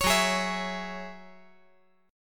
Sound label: Gb 7th Suspended 2nd Gb